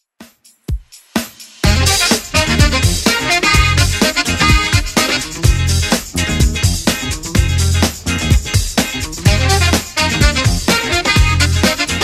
70s Redrum 126bpm

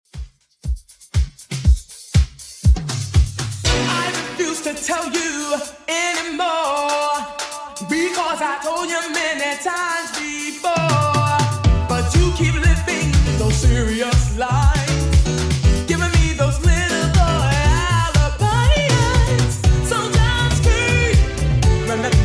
80s Redrum 120bpm

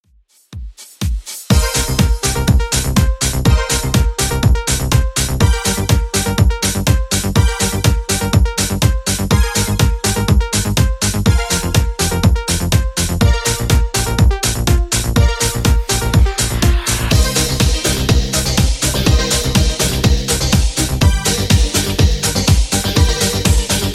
80s Redrum 123bpm

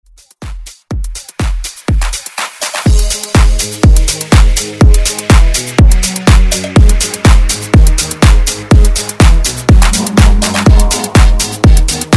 Dance Redrum

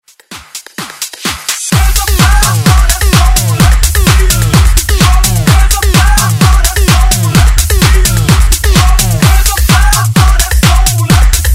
house redrum

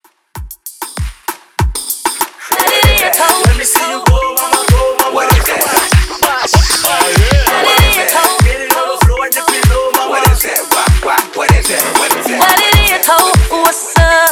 Top40s Quick Scratch In Outro 97 BPM

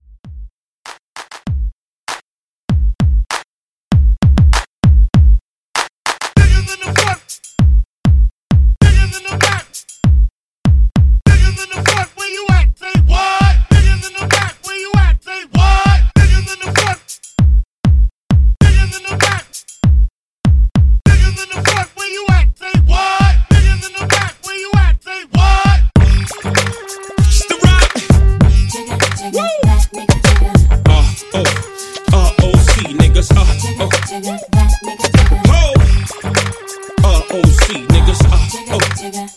Hip Hop Redrum Hype; Remixfreakz

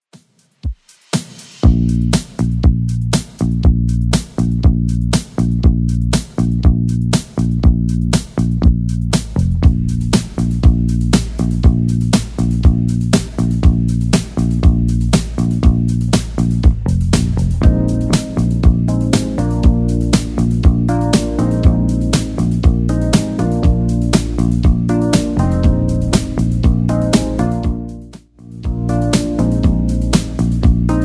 70s redrum 120 bpm

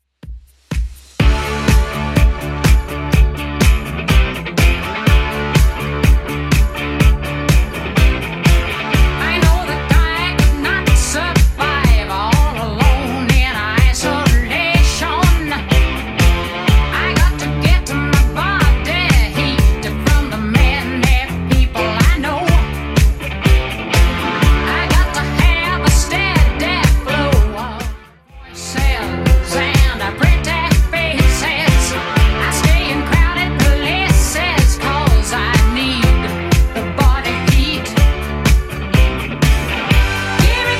80s Redrum 124 bpm CLEAN

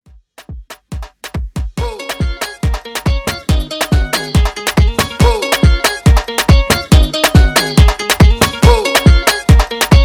Dance Afro Beat 140 BPM

